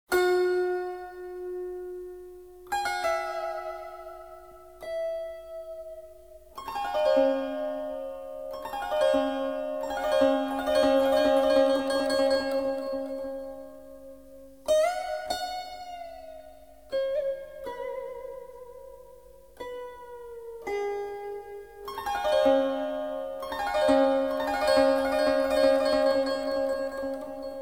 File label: Mei Yun Melody, Music, Asia; Civ5